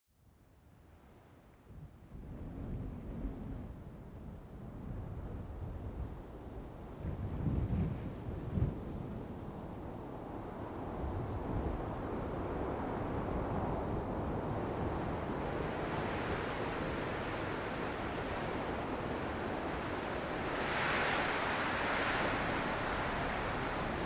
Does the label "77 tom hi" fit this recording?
No